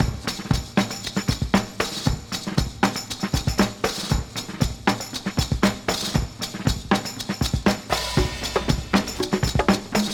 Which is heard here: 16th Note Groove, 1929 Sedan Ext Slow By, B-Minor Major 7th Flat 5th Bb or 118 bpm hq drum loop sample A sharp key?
118 bpm hq drum loop sample A sharp key